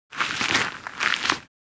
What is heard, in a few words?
Dirt cracking with debris